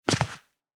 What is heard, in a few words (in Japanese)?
スリッパ 一歩; 足音の効果音